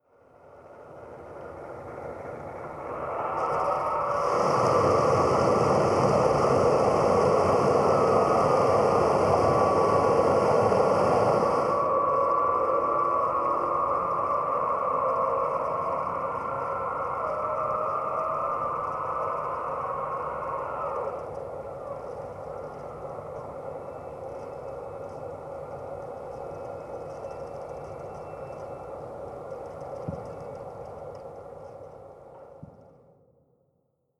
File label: atmosphere screaming water, Horror; atmosphaeren umwelt